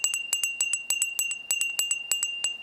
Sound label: bell